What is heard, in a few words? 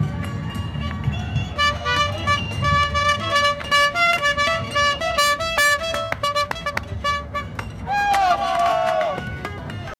produce a triumphant but, ceremony